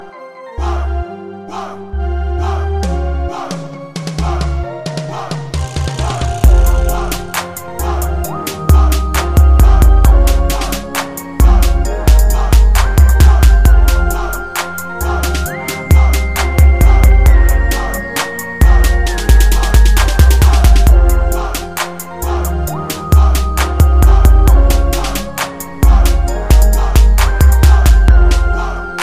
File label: Crunk Beats